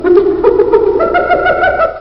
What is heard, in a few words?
Hyena Laughing Halloween Sounds Car Horn